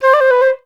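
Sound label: flutes C4; Best Service; Peter Siedlaczek's Advanced Orchestra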